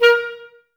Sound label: Woodwind Brass Ensemble; Miroslav Vitous